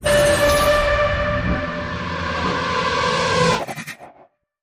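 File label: Creature; Mothman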